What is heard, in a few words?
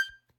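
Hohner Special20 vibrato release G4, harmonica; Aerophones; Releases; Free Aerophones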